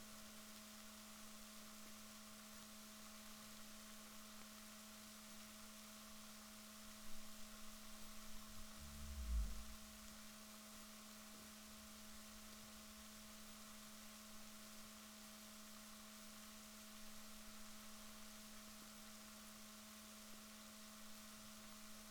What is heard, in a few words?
rorquals negatif, Balaenoptera Physalus Chile